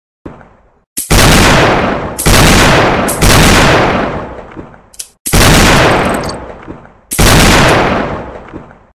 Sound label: Colt M1909 Gunshot Gun